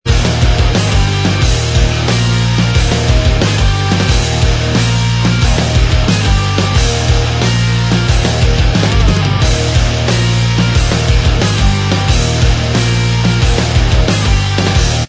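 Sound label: punked up instrumental Track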